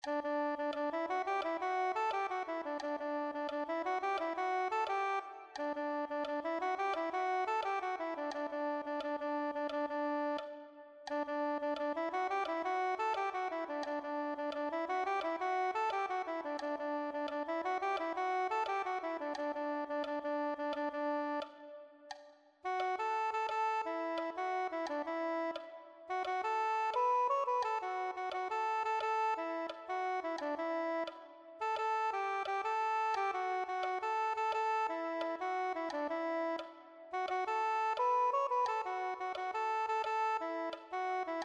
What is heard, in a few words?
soprano saxophone